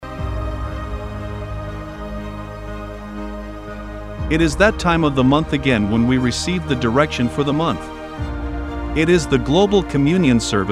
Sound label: Jingle